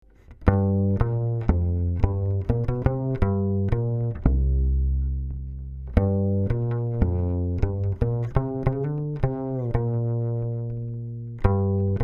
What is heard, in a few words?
Upright Bass DI; Rupert Neve Designs Portico 5017